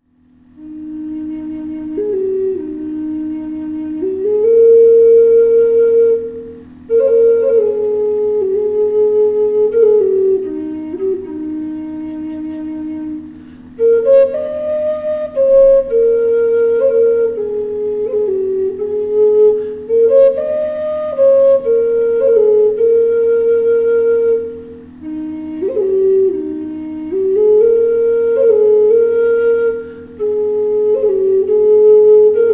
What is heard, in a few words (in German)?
Klangprobe E Flöte